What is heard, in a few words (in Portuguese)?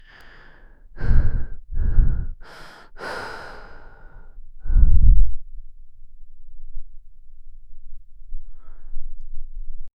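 respiração suave mente, meditation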